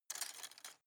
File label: cutlery plastic drawer place soft